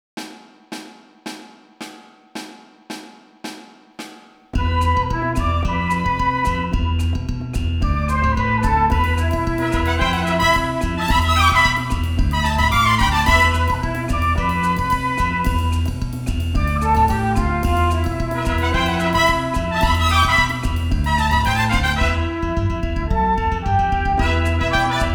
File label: Recorder Samba